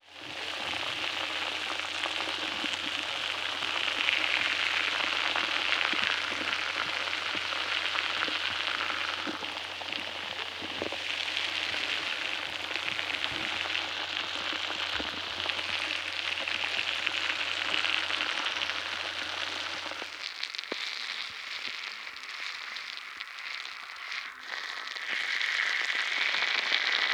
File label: Water Oxygen crispy bubble